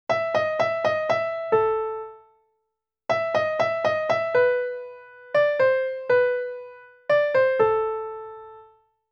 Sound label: Piano Practice; FINAL FANTASY III Original Soundtrack (NDS)